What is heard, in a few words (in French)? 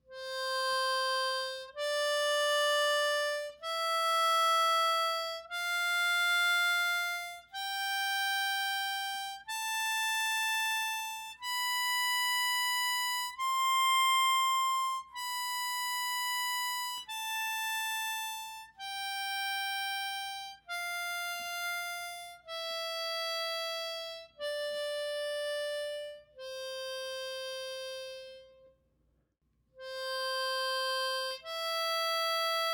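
gamme de DO majeure ascendante et descendante avec arpège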